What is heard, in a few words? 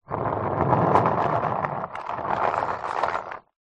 sport skateboard plastic short skate close by